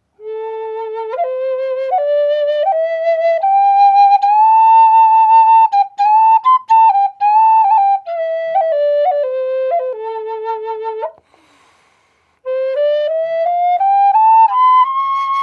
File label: a minor dry, flutes